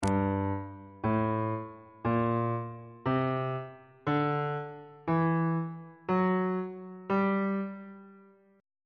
g melodic minor scale classical descending on bass clef